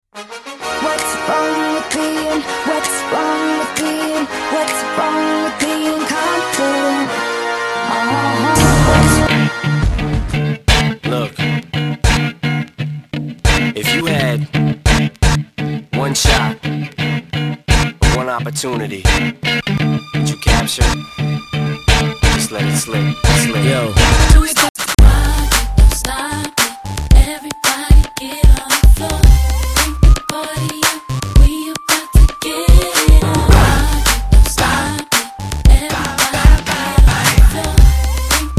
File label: Cheer mix